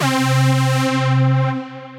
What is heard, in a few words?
Lead, Synth Lead; Intermix